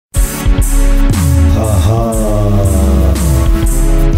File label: hahaaaa